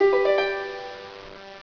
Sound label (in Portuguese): harpa